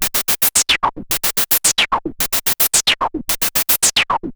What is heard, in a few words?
MiniBrute 110BPM, Gated Seq Noise